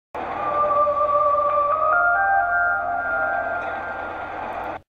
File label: Jingle